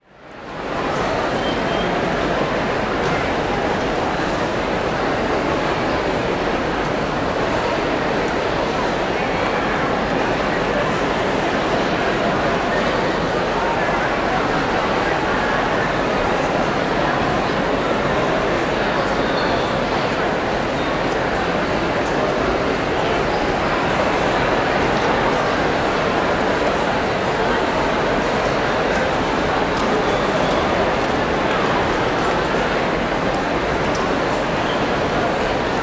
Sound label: Crowd Indoor Overcrowded Reception Hall; Walla; Sound Ideas; Walla Series